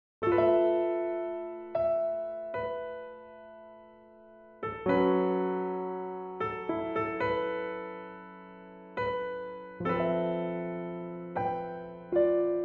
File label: improvisation